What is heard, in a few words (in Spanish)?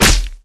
pelea